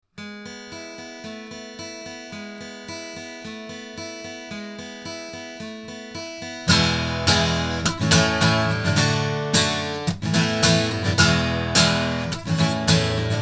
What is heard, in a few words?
sE Z5600a II 8 guitar; se electronics